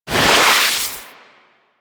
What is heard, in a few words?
Tengu tornado cast; Warframe